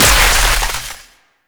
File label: Arctic Power Cast; Warframe; Eximus